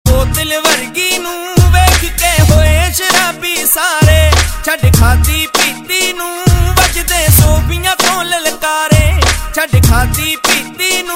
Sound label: Punjabi Hiphop Ringtone